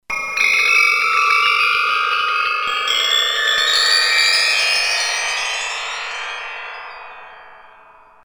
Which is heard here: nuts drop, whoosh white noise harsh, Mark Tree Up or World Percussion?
Mark Tree Up